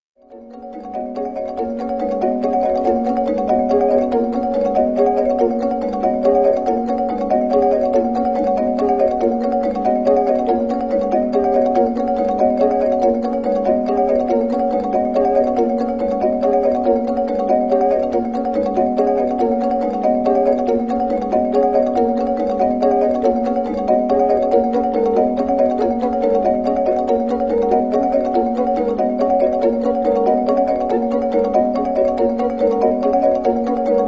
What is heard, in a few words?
drumming